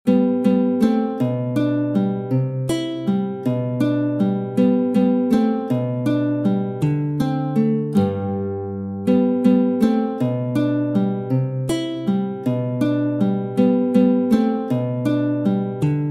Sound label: Walze, Classical guitar; Ferdinando Carulli; Op. 241 Méthode pour la guitare